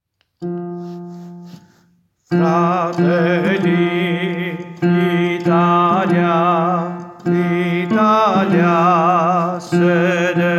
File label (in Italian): inno mameli baritoni